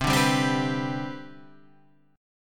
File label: C Major 7th Suspended 2nd, chord; strummed